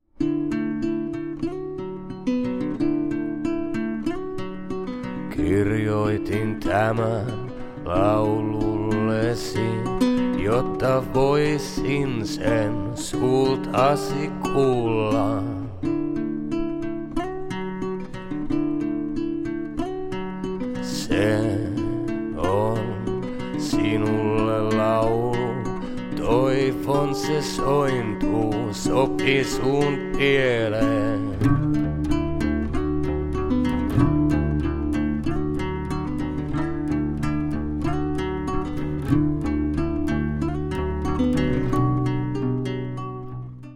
Folk Aesthetic Version

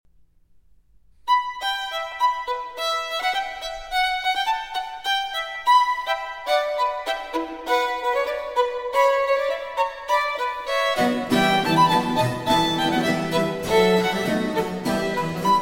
Sonata For Violin & Continuo in E Minor, Allegro

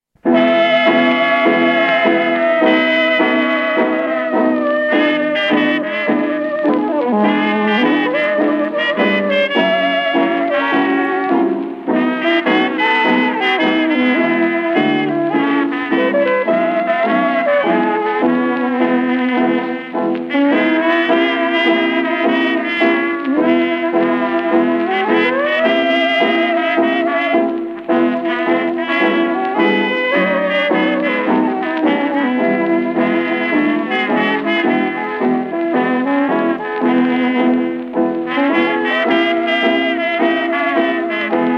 Blues

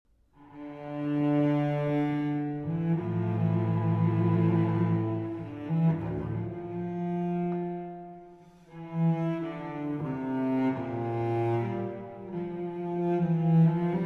Cello Suite in D Minor; J.S. Bach; Cello Suite No. 2; BWV 1008

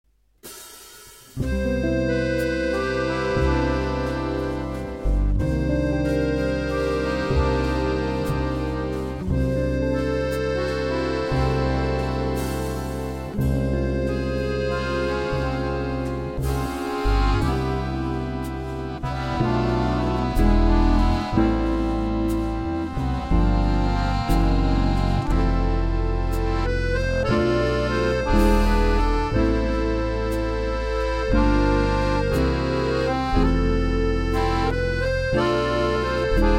Accordion Ballad Version

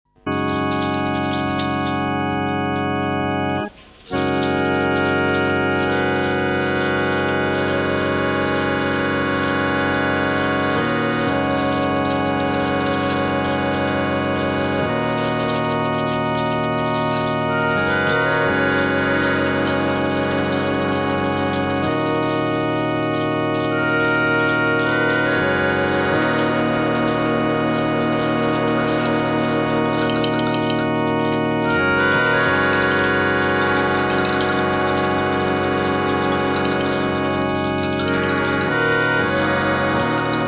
Melodica & Trombone

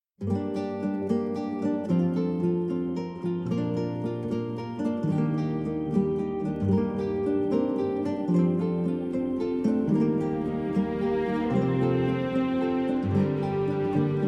Orchestral Version

